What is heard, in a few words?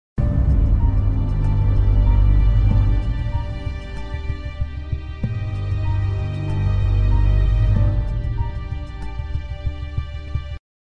suspense